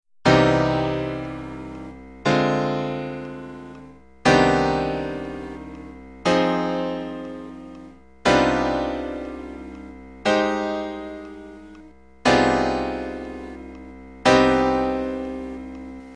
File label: harmonic minor